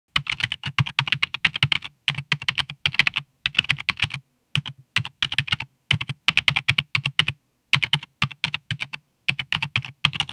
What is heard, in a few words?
a deep rich and resonant, keyboard